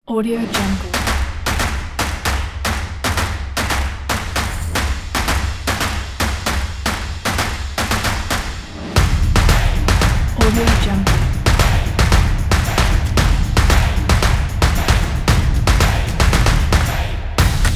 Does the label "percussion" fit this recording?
Yes